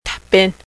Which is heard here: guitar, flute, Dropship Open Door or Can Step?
flute